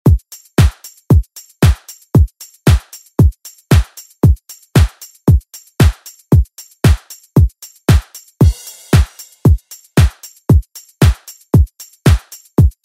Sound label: Pop Redrum; Deejay Hit